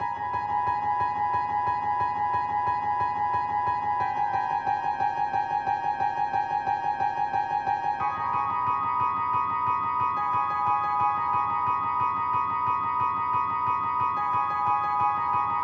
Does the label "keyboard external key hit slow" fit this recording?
No